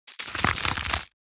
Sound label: Small creature monster pass by